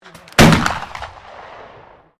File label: MUSKET FLINTLOCK 54 CAL 1840 REPRO FIRING; Black Powder Muskets; Gun Shots (Sound Effects:Guns)